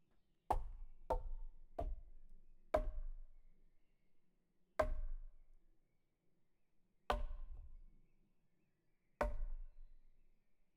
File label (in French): Baton sur buche serie, Percu Bois